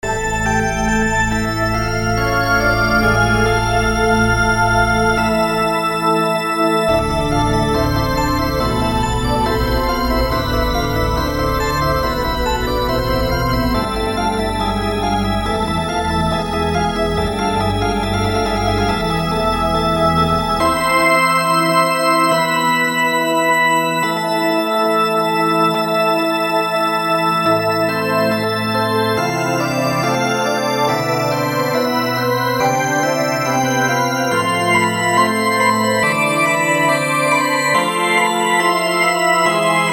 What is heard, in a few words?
Organ